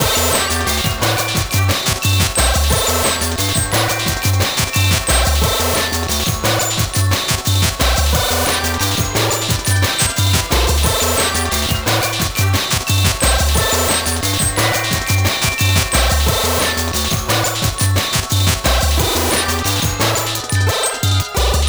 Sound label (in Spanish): gameboy instrumento ableton